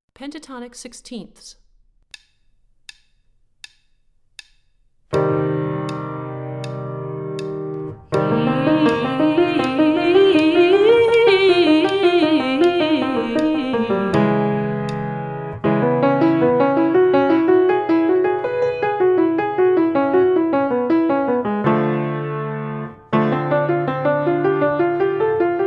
Pentatonic Sixteenths Low; warmups

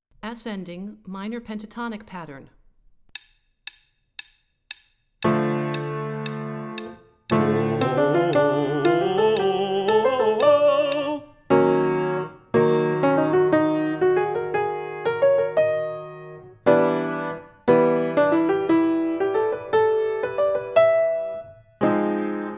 Ascending Minor Pentatonic Pattern High, warmups

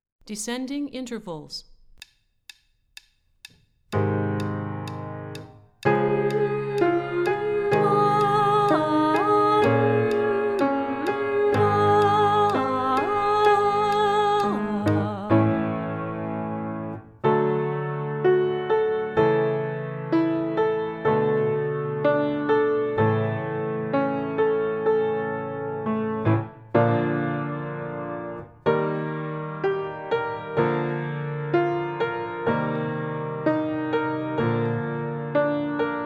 Descending Intervals Low, warmups

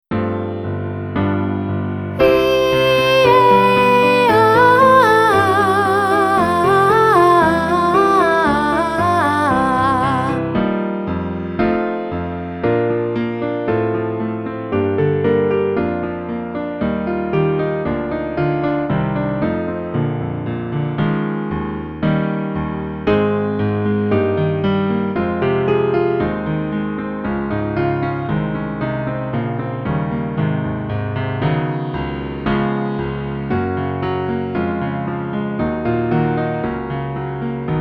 Vocal Warm Ups, Vowels; Vocal Warm Ups Volume 2; Vowels 1

